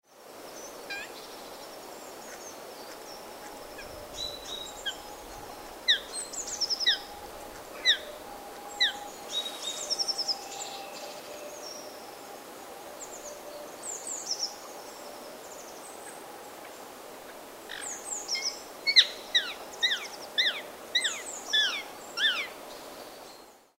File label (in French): perruche a collier, chant